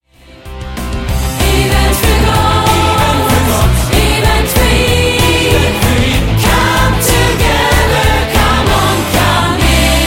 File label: Groovy Vocals